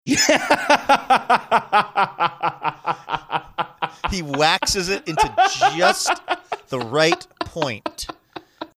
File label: boisterous laughter saying waxes it just right point